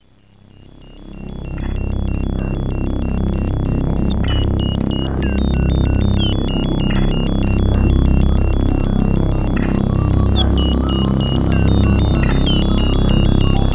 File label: spacing, space atmo; Terra Sound Library; xlarge